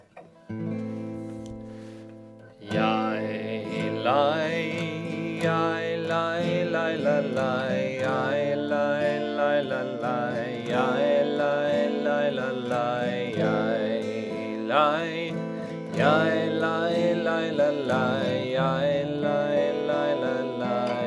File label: Niggun Low Voice; Hebrew language; Amidah